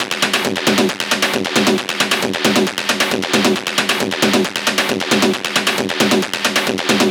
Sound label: Perc Loop 135, Techno